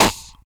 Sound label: VOC DRUM, MOUTH PERC; Best Service Hyper Dance